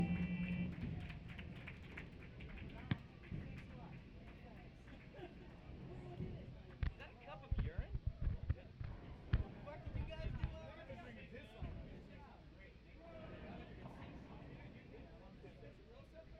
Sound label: Static Radio